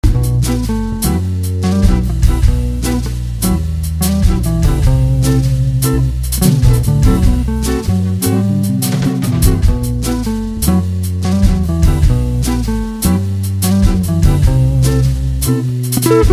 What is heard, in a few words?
Jazz